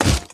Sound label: step walk